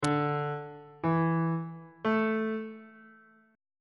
c double sharp suspended 2nd triad chord intervals